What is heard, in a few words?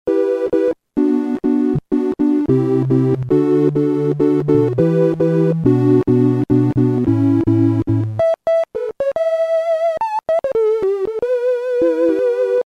Trianglular; Roland M-DC1